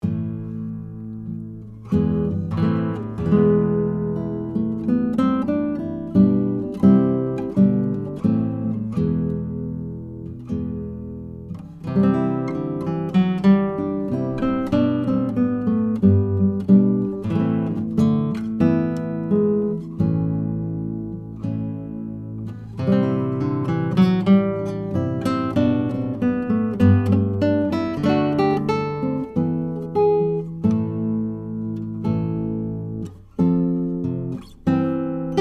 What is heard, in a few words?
Pavan; BARTOLEX SRC7 GUITAR; C414 COMB